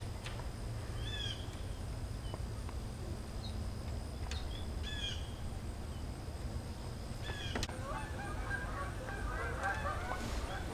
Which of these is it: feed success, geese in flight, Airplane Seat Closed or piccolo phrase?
geese in flight